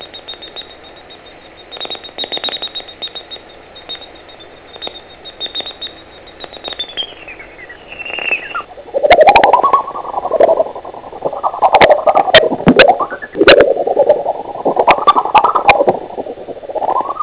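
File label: rhinolophus ferrumequinum; fauna; sismisi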